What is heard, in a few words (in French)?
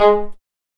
sax note